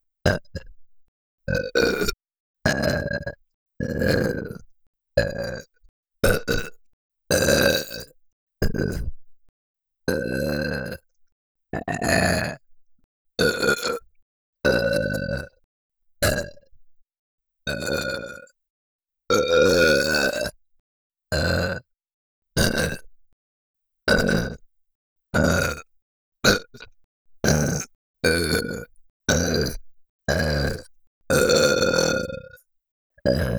最大6人同時 炭酸 ゲップ ゲップのみ; ご奉仕したい子大集合♪

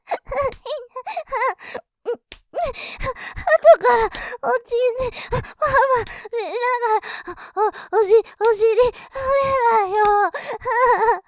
喘ぎ, ASMR; おちんちんパンパンしながらお尻ダメェだよぉ！; ループで楽しむ！シェフの気まぐれ抜き抜きコース; モノラル

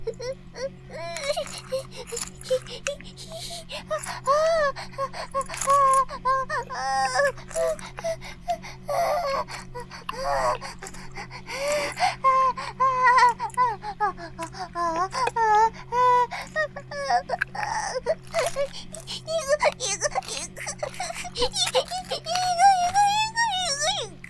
バイブ放置; イクたびにわけて

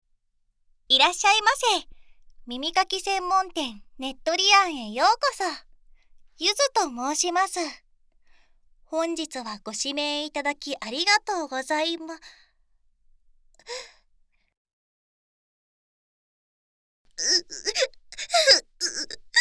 耳舐め; SE OFF

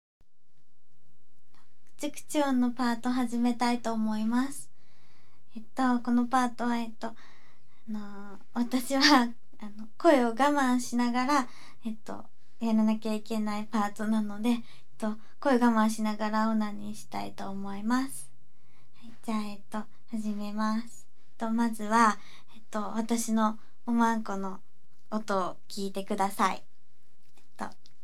あそこのクチュクチュ音を生録音

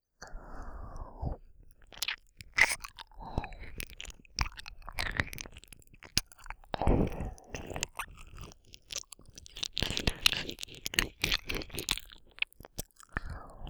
耳舐めループ 左

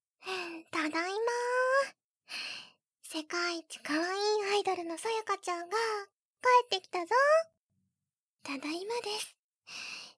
おむつとフェラと耳舐め; 日常の中のアイドル

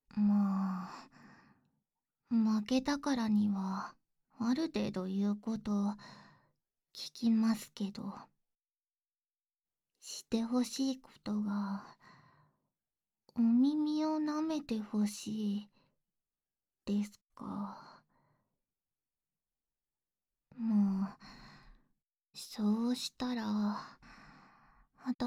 ご褒美の耳舐め（バイノーラルマイク）, ASMR

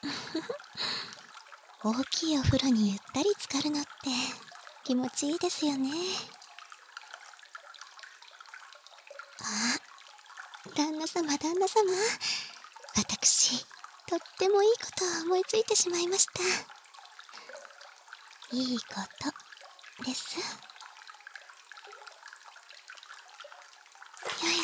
潜望鏡フェラ→パイズリフェラ（バイノーラルマイク収録 効果音あり）

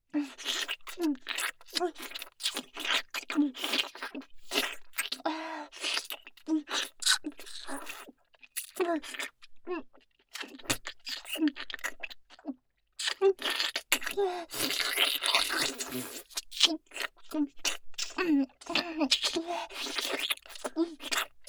激しめの フェラ

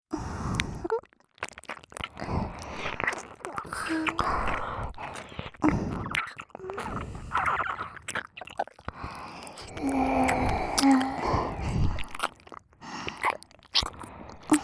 両耳舐め; L書記・R副会長

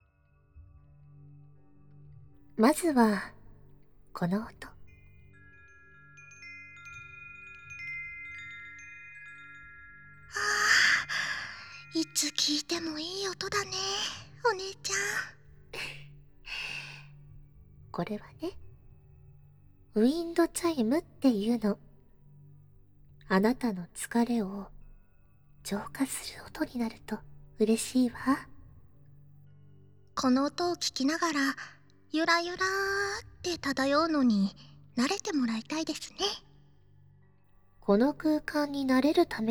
ヒーリングウィンドチャイムと頭なでなで

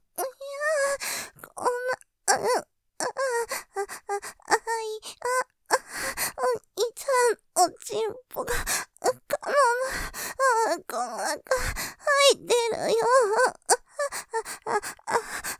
喘ぎ おちんぽが、おまんこの中、入ってるよ・・・; モノラル; ループで楽しむ！シェフの気まぐれ抜き抜きコース

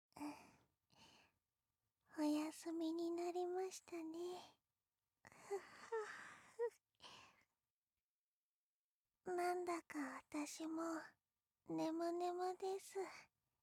添い寝, ASMR

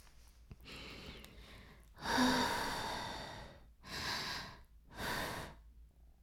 耳舐め音声; 白くて黒い魔性円環

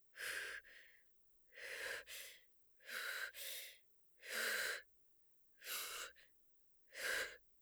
吐く呼吸; 効果音なし

